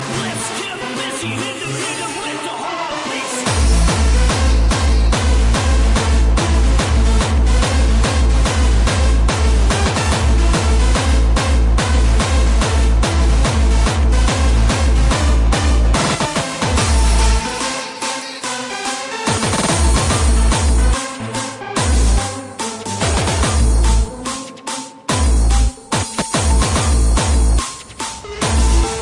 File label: Hardstyle